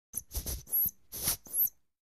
Misc. Sports Elements; Empty Water Bottle Being Squeezed Twice